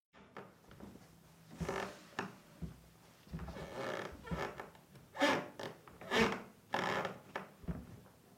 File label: Creaking Short